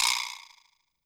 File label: Vibraslap, YAMAHA RM1X